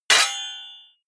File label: hit metal sword medium